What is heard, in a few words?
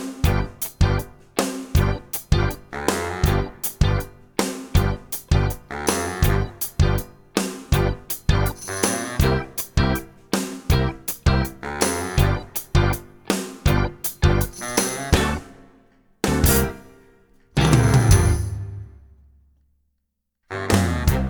instrumental